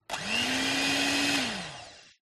glitched tones electric whisk

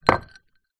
food drink glass ice liquid down table

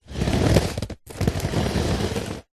rollerblade short roll; foley

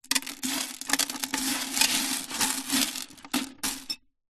fish tank aquarium no water gravel movements; animals

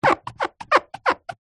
balloon rubber stretched out rub squeak

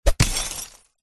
impacts glass swoosh into glass smash

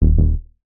game tone error

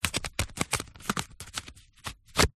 credit card rummage remove from wallet; foley

